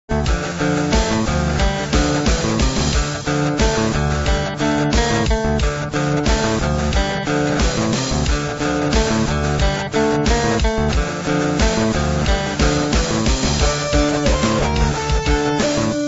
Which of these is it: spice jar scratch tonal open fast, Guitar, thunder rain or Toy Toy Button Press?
Guitar